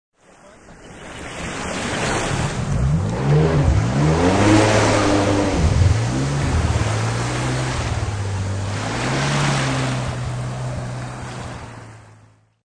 Jetski Taking Off, High quality stereo recording of a jetski leaving from shore.; Boats; Sound Effects:Vehicles